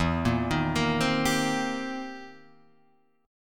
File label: C Minor 7th E; arpeggiated